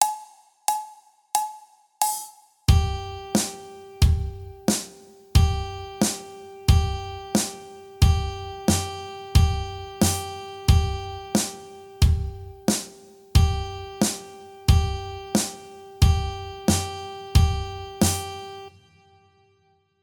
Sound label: G NOTES SLOW